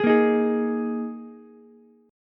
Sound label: G sharp Suspended 2nd, G sharp, down, Ukulele; G#sus2; strummed; JGuitar